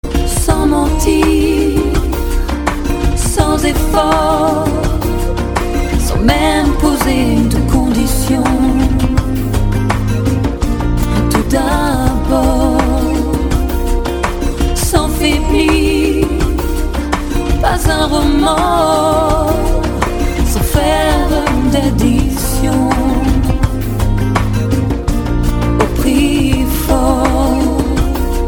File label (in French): chant